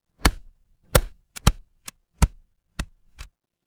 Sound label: office stapler